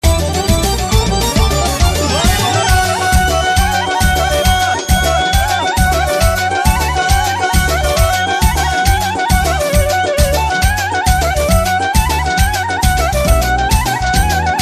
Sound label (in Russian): Klarnet, azerbajdzhanskaya svadebnaya